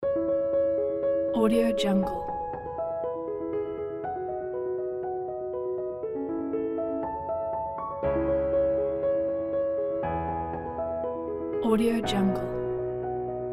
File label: calm inspiring epic